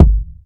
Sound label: kick drum sound g sharp key